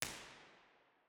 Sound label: typing room; terrys; b-format